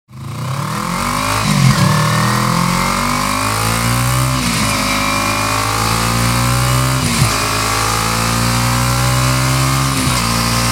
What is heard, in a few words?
KAWASAKI NINJA 300 SLIP ON GP, exhausts